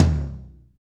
tom, Real Kit

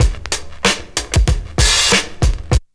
break; Zero G - Man Machine

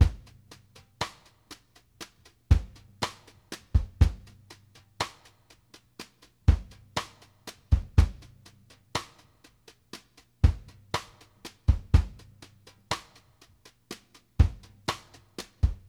STRGHT, BALLAD 60; Sampleheads; New York City Drumworks VOL-1; SP BALLAD 60

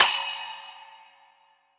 Splash; Roland SPD-S

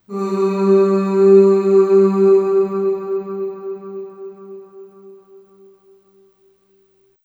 Ooh Short G2 Long Reverb; Harmonic Building Blocks

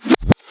fill cruel rev

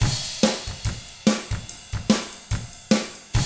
ROCK, Double Platinum Drums

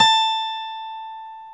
OVATION A GT A4; AKAI S-Series CD-ROM Sound Library VOL-7

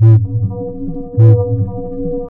Tabla, 105 BPM; Zero-G; Pure Tabla

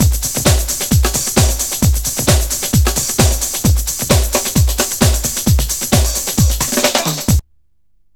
DRUM, 132; Megabass Remix! 2

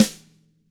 DW HIT FD-R, DRUMS SNRD; ILIO; Double Platinum Drums 2

